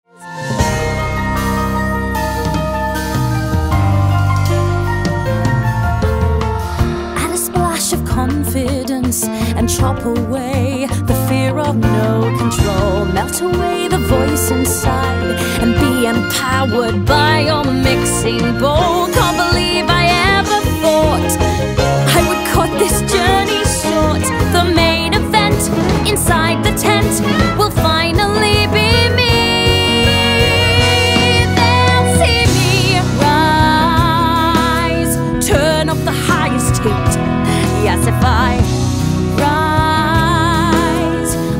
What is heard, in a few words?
rise vocals